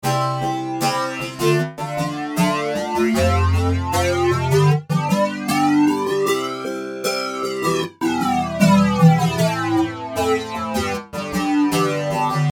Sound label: Ac Guitar